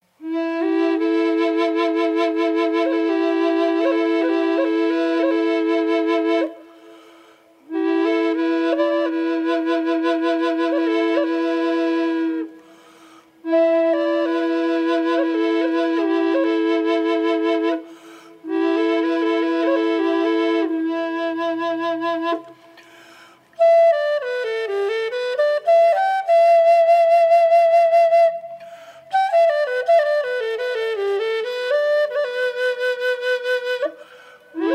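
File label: E minor birds eye inlaid drone wet, flutes; drones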